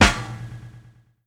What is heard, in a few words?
deep snare sound d sharp key; snare drum samples; one shots